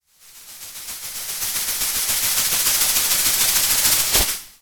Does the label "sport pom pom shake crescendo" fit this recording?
Yes